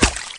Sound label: Bullet Water, Impacts